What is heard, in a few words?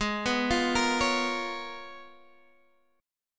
C sharp 13th, G sharp